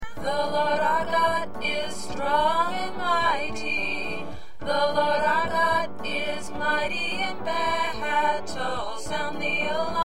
Alto

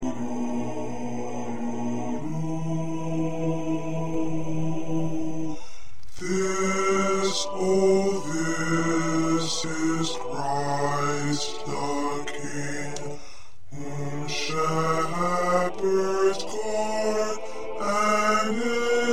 Bass; What Child Is This

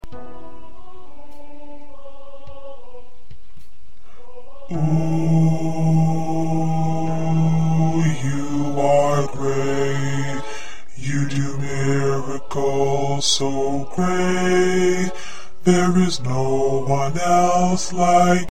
Bass

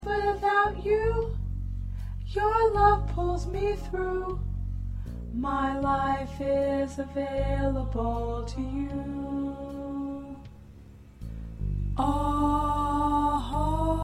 Soprano, choir parts

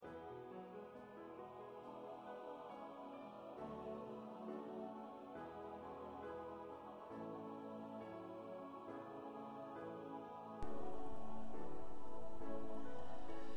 Choir 2 Tenor

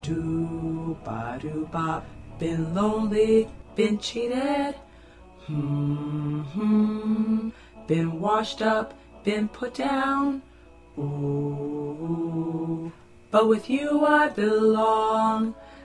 Bass

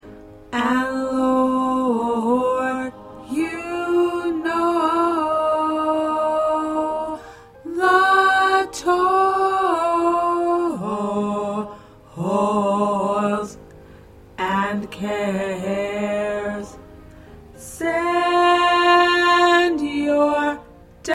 Alto 2

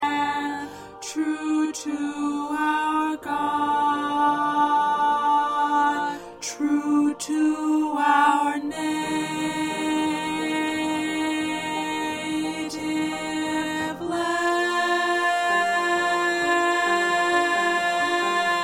1st Tenor, choir

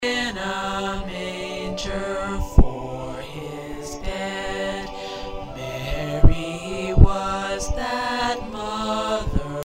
Bass

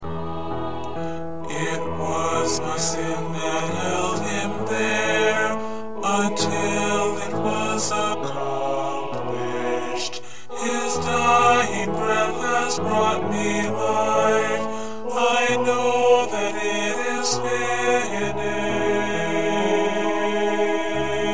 Bass

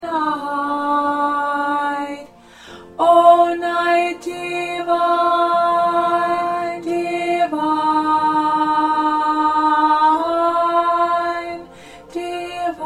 Alto; O Holy Night

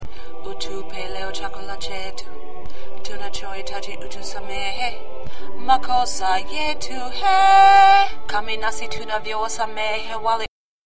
Lead Tenor

